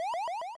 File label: save